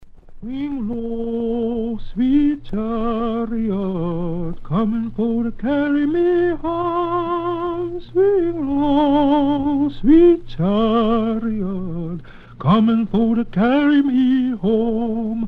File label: swing low; Rustin; 40s